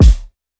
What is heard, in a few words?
Hiphop kick